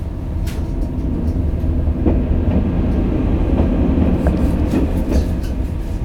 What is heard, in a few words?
Belgian Train Loop